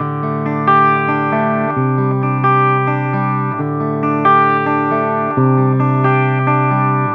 134 Pop Guitar Arp D maj, Electric; Pop Rock; Electric 100-160 bpm; Pro Tools Essential Loops; Digidesign

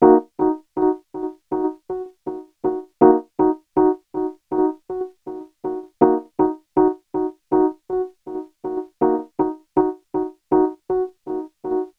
W-Coast HipHop MarkII Ebm; Digidesign; Pro Tools; Pro Tools Essential Demos; Essential Loop Demos; Demo Session - Hip Hop

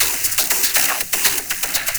120 Dance E-Perc; Pro Tools Essential Loops; Dance DJ; Percussion

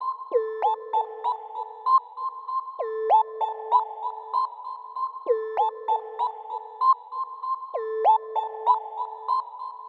HipHop Synth Amin; Digidesign; Pro Tools Essential Loops

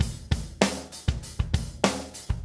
098 Steady Drums; Pop Rock; Moderate Tempo; Pro Tools Essential Loops; Digidesign

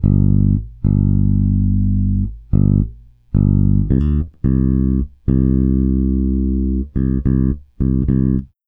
Country Bass Intro Alt; Digidesign; Pro Tools Essential Loops

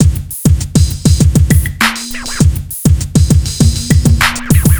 Hip Hop Beat, Drums; Digidesign; Pro Tools; Pro Tools Essential Loops; Drumbeats

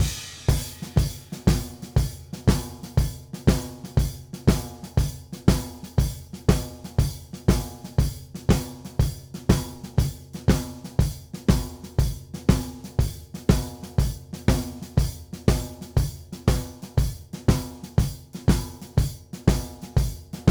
Blues Drums; Digidesign; Pro Tools Essential Loops

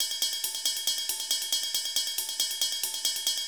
Techno Ride; Dance DJ; Drums; Cymbals; Digidesign; Pro Tools; Pro Tools Essential Loops

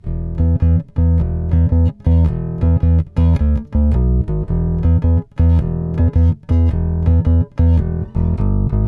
Blues Bass; Intro; Digidesign; Pro Tools Essential Loops

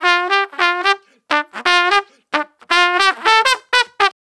110 NuJazz Trumpet _Dmaj; Brass; Pro Tools Essential Loops; Digidesign

